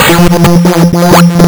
lsdj waves; wavetables

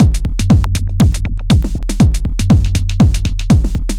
xtra techno beat; Sonic Foundry (Sony Creative Software); Ugly Remnants VOL-2

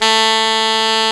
A2, REED; AKAI S-Series CD-ROM Sound Library VOL-8

